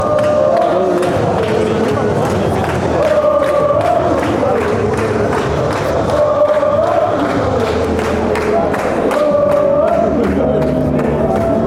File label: fanchants; lokeren